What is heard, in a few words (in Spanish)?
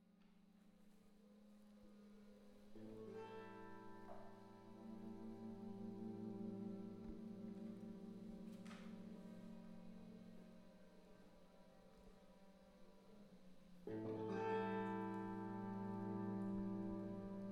campanas